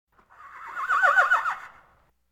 Animal effects snorts grunts farm ambience

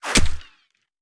Bullet Impact; Sound Ideas; Guns

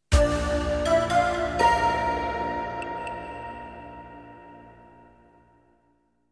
jingles; 90 DDD Jingles

